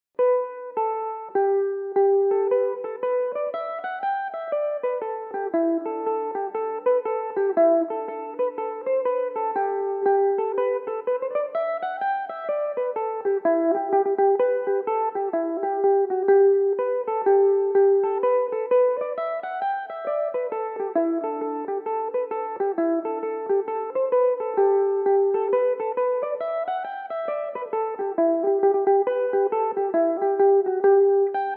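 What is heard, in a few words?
G major slow version, Irish mandolin; Over The Moor To Maggie; Belmuse EM-190 electric mandolin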